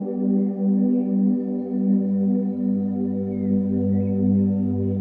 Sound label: Relaxing Music Healing Music Yoga Calm Music Sleep Music Zen Study; Meditation Music